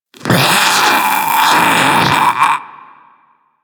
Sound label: Infested Splitter Top Vocal Alerted; Warframe; Grey Strain